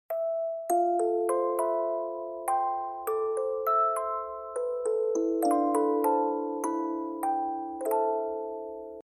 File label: Replacing Semitones with Sol G